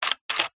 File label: technology camera dslr take picture shutter close open; zapsplat